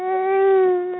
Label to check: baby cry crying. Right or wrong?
Right